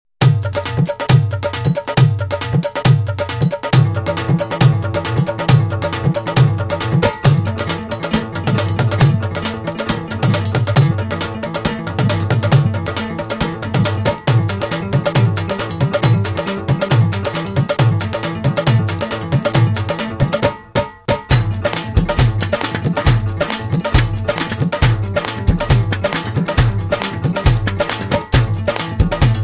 Drum Drive

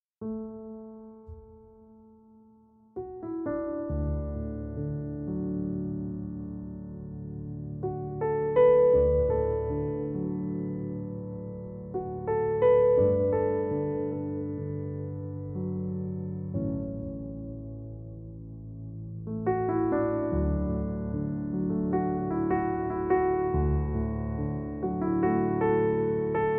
Arr. For Piano; Max Arnald

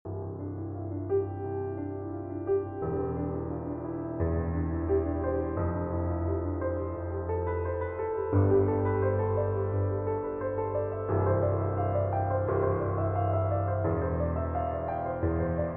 Invention in C Major

